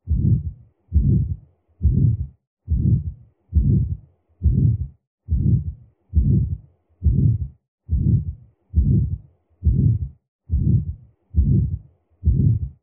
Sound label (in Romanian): stenoza de aorta focar aortic